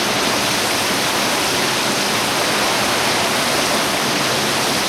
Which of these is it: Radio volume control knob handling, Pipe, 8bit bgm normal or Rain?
Rain